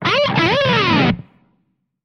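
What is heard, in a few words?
cartoon whistle at the sight of a beauty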